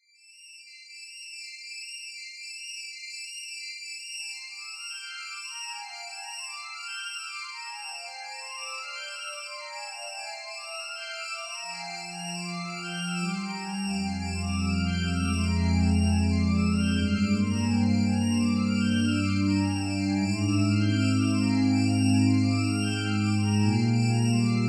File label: CANON